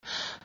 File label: Breath; Lewd SFX